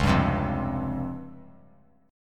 C sharp Major 7th Flat 5th, C sharp, chord; strummed

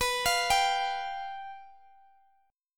G 7th B; arpeggiated

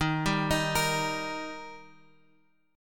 Eb Major, down, Guitar, Open G

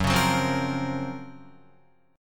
Gb Major Sharp 11th Gb, Guitar; strummed; 2,4,4,5,5,5; JGuitar

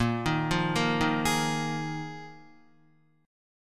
Bb 7th Suspended 4th Bb down Guitar; arpeggiated; JGuitar

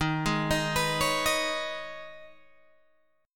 Eb 7th Suspended 4th Sharp 5th, Guitar Open G; 13,13,13,16,14,13; down

